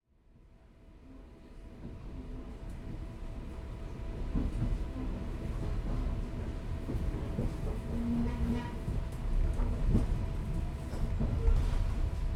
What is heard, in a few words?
ambience train